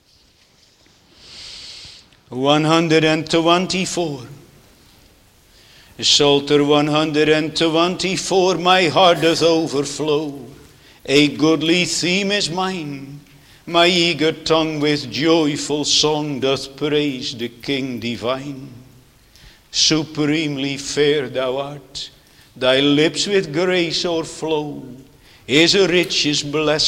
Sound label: Singing; The Desire of the Spiritual Bride; song of solomon 1 2